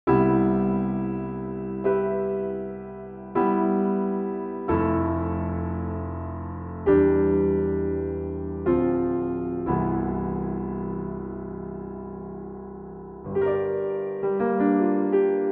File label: Steinway